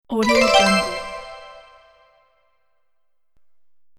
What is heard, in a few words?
bonus sweetener